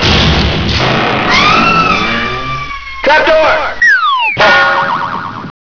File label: TRAPDOOR